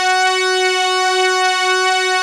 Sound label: OMNI STRIN, ARP Synths; E-MU Formula 4000 Series Vol. 3; Analog Odyssey; ARP Synths II